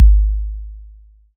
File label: Kick Analog Mono